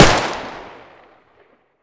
sterling distance fire

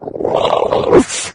leap, flood

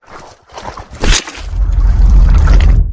unarmed melee; flood; carrier; predatorcz; halo

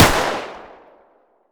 ots33 distance fire

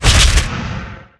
woosh

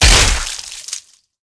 body head; dismemberment

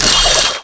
saw flesh, circularsaw hit; weapons

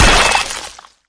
pop; flood

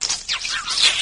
move, flood infection

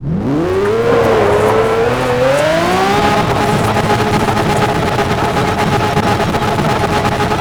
rev, vehicles, veneno; sgmcars

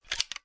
rem870 insert

